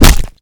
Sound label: Projectile Sounds; Red Orchestra Ostfront 41-45